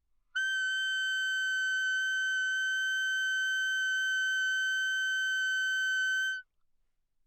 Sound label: high, anechoic classical clarinet c; OPENAIR